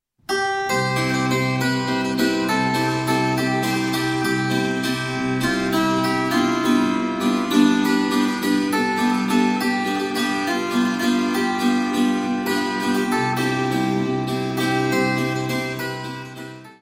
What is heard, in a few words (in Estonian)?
Noorpaari valss